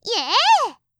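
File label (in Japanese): "イェー！" Japanese, 合いの手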